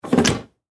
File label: Foosball Table; Foosball Table Players Turn; Games; Sports